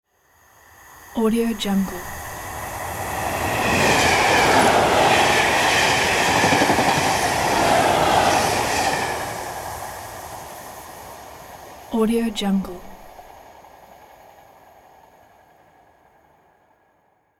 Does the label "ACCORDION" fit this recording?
No